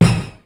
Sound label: FUNK, DRUMS; Kid Nepro; Elite